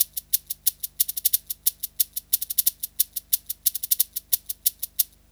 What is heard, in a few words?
Shaker Loop; Sonic Foundry (Sony Creative Software) - Fluid Dynamics Drum & Bass; Construction Kit; Percussion